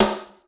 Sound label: Snare, Yamaha RY20